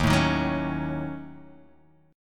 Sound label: Eb Minor 7th Flat 5th, chord; strummed